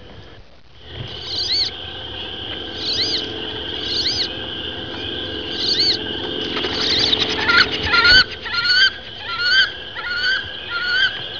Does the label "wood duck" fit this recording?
Yes